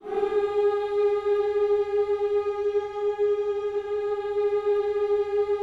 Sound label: HMMS, Gospel